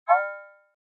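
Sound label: Electric Bounce, Multimedia Element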